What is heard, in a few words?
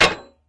axe pole ground; smite; chaac